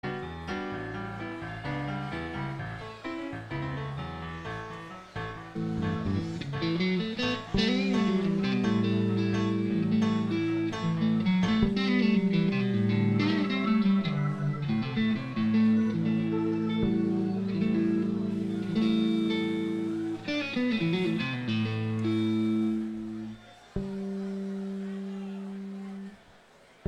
Tuning and Introduction

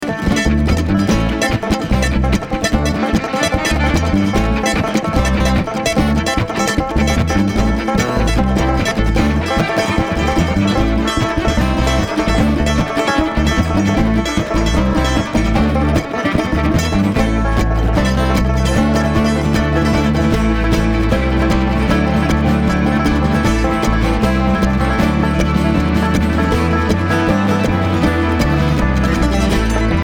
Traffic Jam

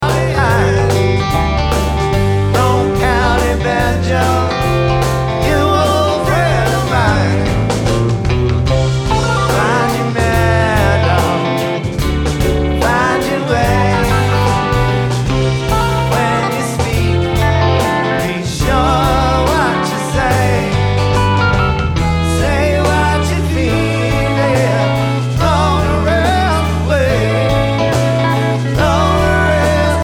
Banjo

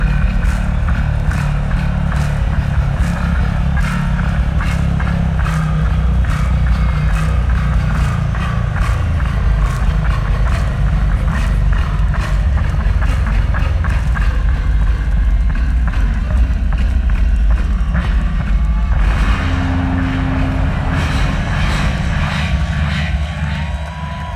Bass Solo

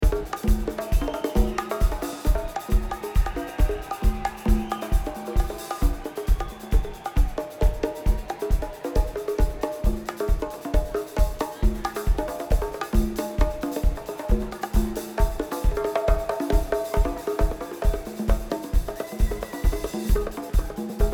Drums

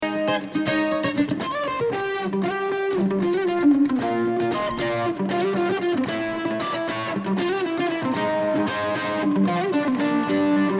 Kentucky Mandolin

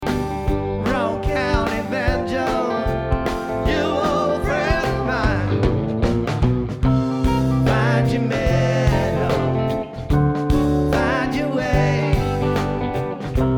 Banjo

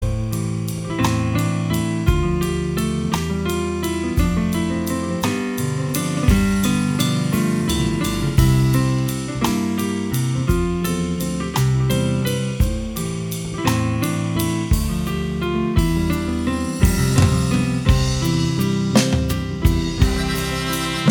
Joy Waltz

